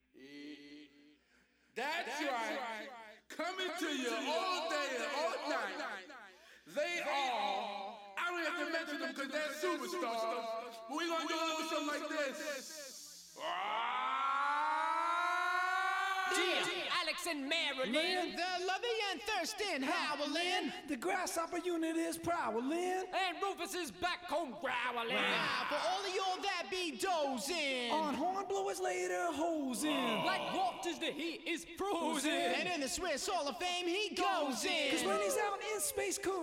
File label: acapella